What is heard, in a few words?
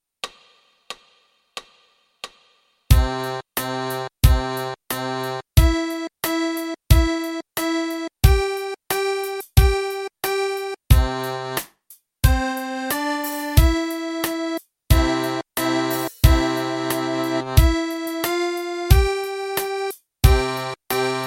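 Fisarmonica, Mano Destra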